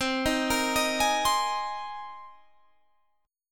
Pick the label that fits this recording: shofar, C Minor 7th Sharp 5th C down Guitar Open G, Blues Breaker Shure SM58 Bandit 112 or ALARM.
C Minor 7th Sharp 5th C down Guitar Open G